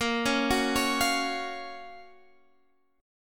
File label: Gb Major 7th Bb, guitar